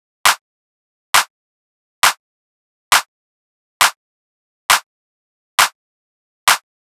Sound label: Clap Loop 135, Techno